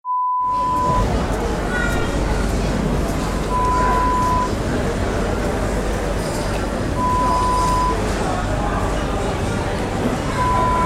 Food Market sound

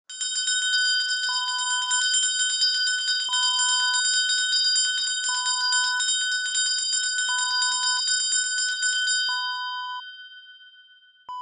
handbell

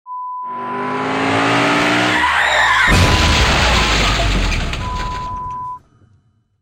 Car Crash

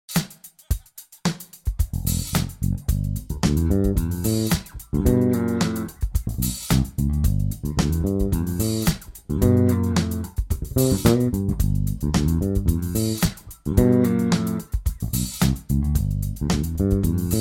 Bluesy bassline G7

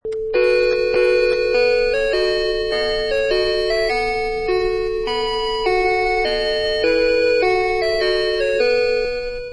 Toy Row Your Boat Melody

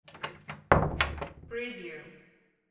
door close

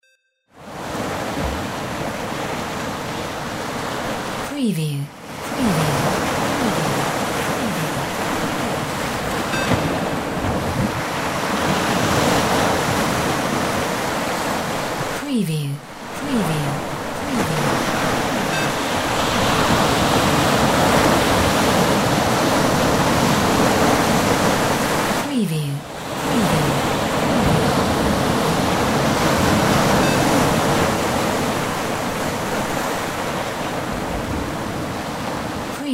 SEA ROUGH; windy beach audio

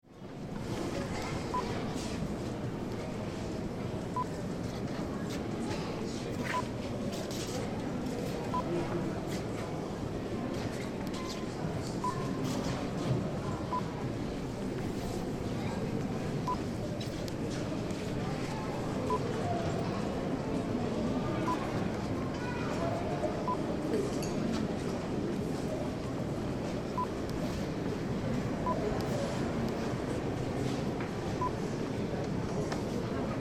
Museum Ambience London

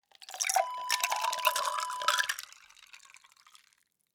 ice water pour

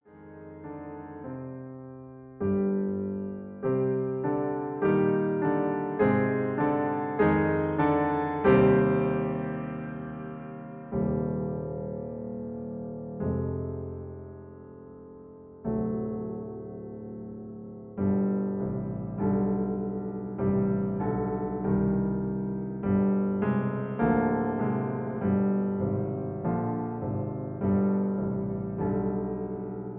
Accompaniment C

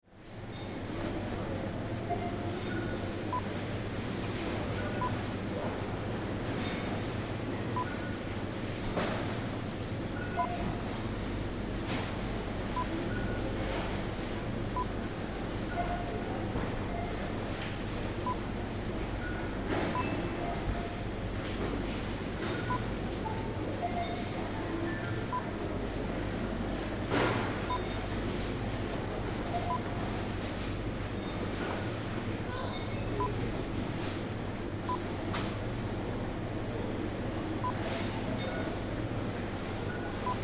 Super Market Ambience Drinks Aisle; Tesco

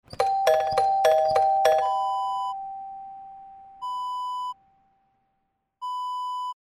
doorbell ding dong

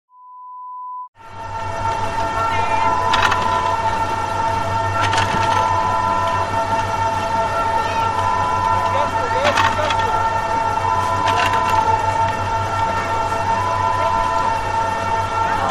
Ski Lift

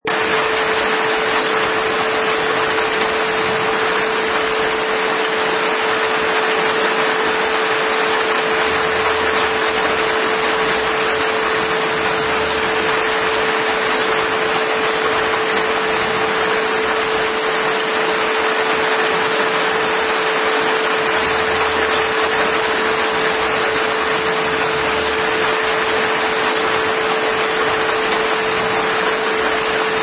Rain

